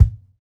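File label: kick, Roland MV8800 drum kit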